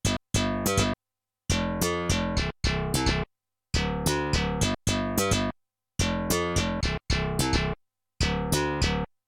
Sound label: Bass - synth strat; XV-88